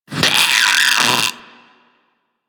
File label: Infested Splitter Top Vocal Pain; Warframe; Grey Strain